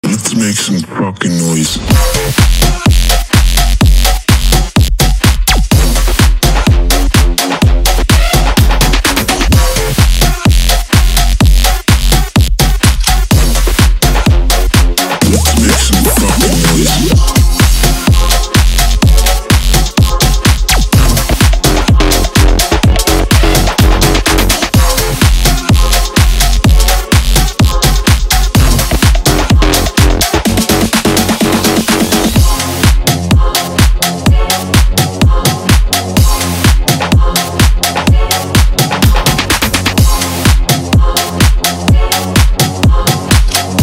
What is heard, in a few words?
bass noise